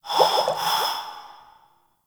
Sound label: Grineer Frogman Breathe; Warframe; Breathing